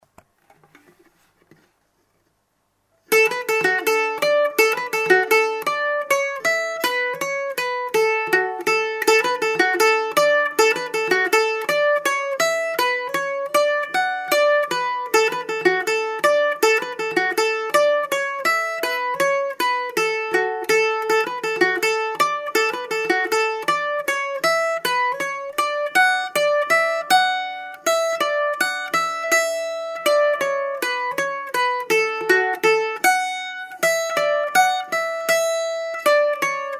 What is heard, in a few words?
Polka; Annaghbeg